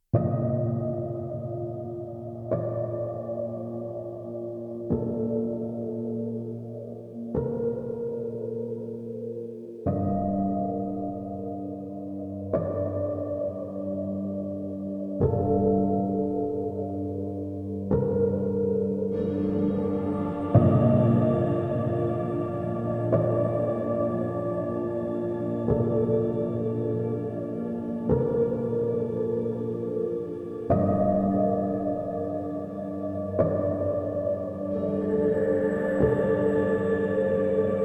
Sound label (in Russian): Фанни (piano version)